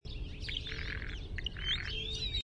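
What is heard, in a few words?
Blue Jay rattle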